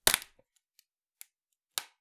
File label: Palette, WoodenPalette